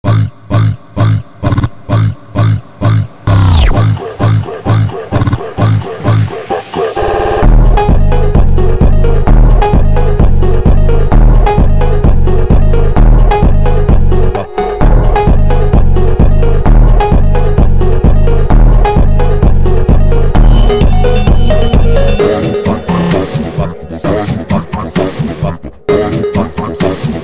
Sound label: funk oscuro brazilian phonk